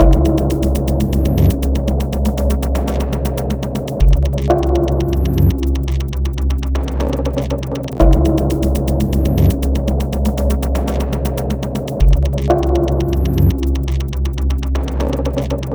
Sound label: Alert Loop beat; tokyo 42; Cathedral Area